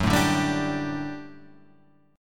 D Suspended 4th F sharp, guitar

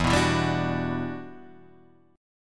E Minor 9th

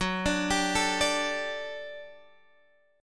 D Suspended 4th F sharp, guitar

